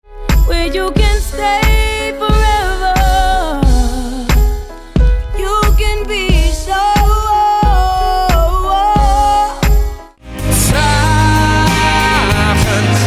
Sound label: OVERDRIVE